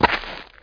step gravel; SpecOps2 MPlayer Demo

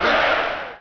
punch; wcwnitrodemo

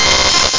body blow

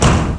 pipe; ULTRA GAMEPLAYERS - Disc 104 - Dec. 1997

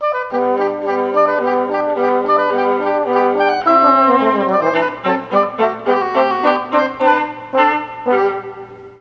Tbn Ob Vla; Juilliard Music Adventure

